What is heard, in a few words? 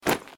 Landing